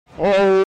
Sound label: ooohhhhhhh